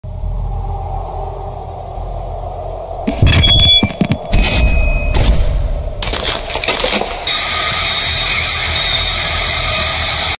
ahhhhhhhhh; whitty

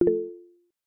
group chat received; Google Meet Chat

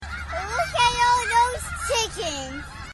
chickens

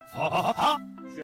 laugh; spy

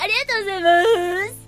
polka

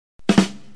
bad joke drums

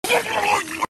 hmmdfgmmdgf

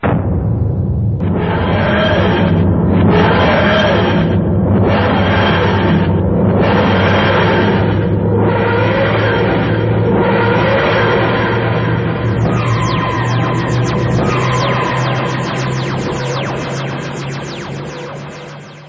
TARDIS takeoff

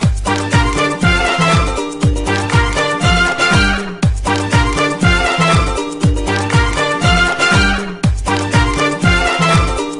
victory; rayman

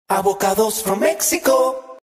jingle; Avocados from mexico

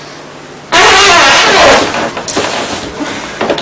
fat ass fart

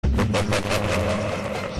evil laugh

